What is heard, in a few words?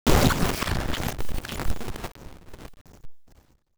Tech, Weird Side Samples